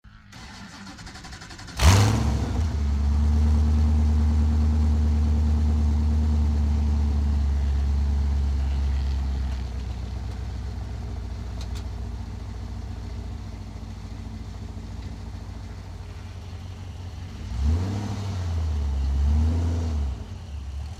Chevy V8 Hot Rod Long Sound Engine Start Drive Slow Idle; Recording from driving around onboard a Chevy Hot Rod. Ignition, drive slowly, then idle